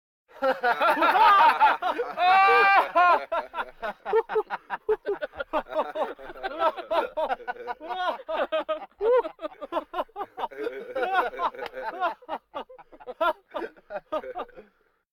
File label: Vocal effects breathing Moaning Baby